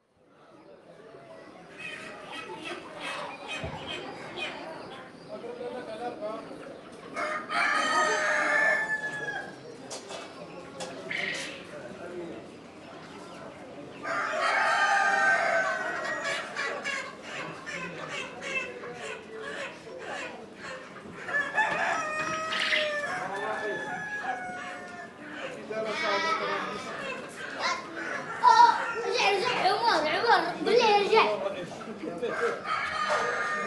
market for chicken hen and bird outdoor voices and activity; sonniss gdc